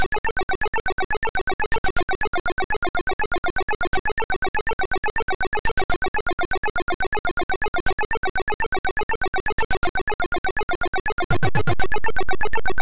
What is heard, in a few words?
METAL